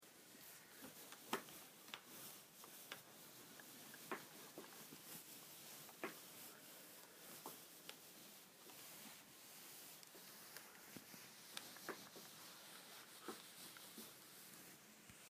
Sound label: Electrified Environment